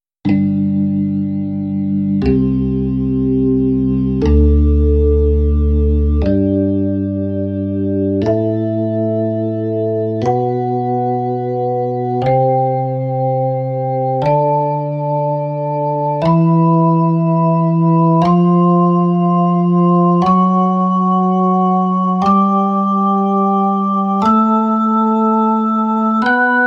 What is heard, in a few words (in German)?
tonleiter midi